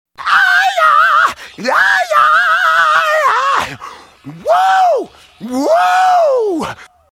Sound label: yell